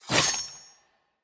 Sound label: dagger slice; smite; pele